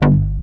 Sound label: Arp B G1, ARP Odyssey; synth; musicradar-synth-samples